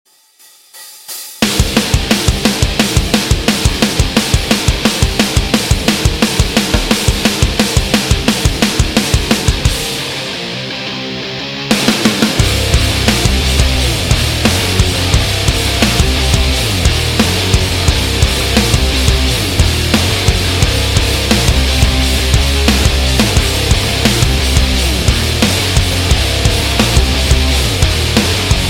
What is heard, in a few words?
Energy Metal Nu Metal Groove; Rock Zombie